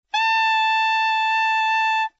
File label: B6 alt 2, tenor saxophone